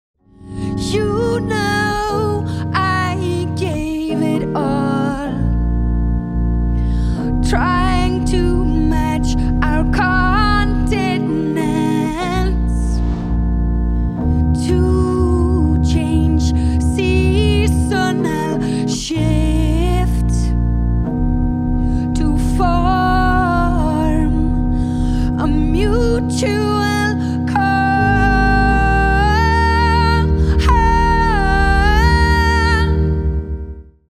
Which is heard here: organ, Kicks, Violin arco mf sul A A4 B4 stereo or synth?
organ